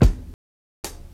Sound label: treble rich steel kick drum g sharp key; Duration 0:01; Samplerate 44100 Hz